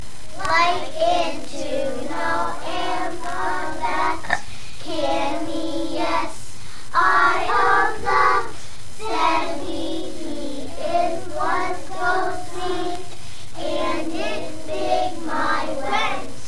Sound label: sight word song